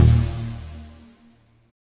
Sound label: NOISY Bb1; Jack Sparrow Deep Dubstep Progressions